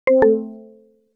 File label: win